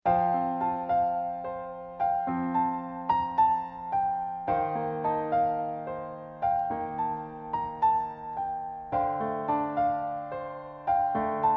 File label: alto; twopart